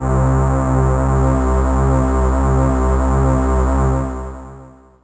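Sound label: c2, synth, Ensemble, FAIRLIGHT; Best Service ProSamples vol.55 - Retro Sampler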